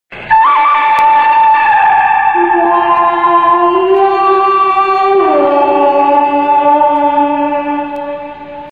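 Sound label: western cartoon ringtone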